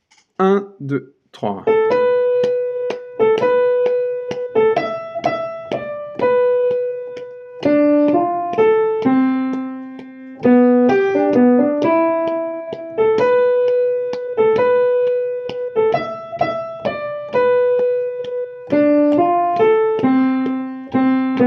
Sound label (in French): partie valse; La Destimborlada